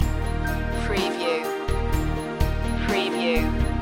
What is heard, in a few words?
hidden past loop